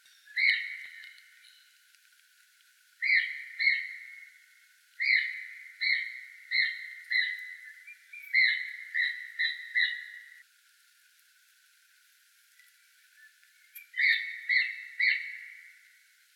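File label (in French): marmotte, cris d'animaux; enregistrés par Jacques Prévost de la LPO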